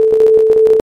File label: volume random 64hz